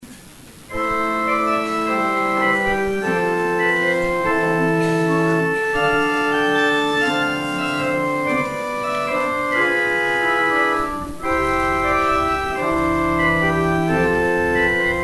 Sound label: organ interlude; Pastorale -Leon Boellmann; St. Mungo's